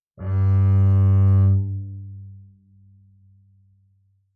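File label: Bass arco fortissimo sul D G2, Double Bass; MIS Pitches - 2014; Strings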